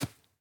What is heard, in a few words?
grass, walking; factorio 2.0.55